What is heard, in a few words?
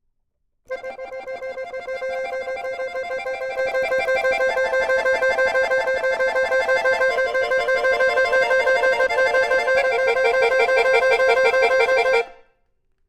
accordion, MONO